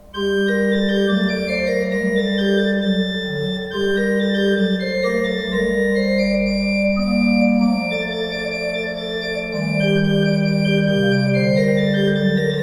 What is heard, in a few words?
pipe organs; Paul Ott 11 II P